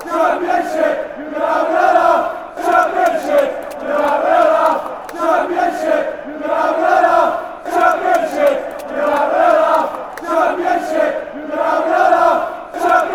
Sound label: fanchants; ncfc